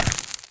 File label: warrior walk stone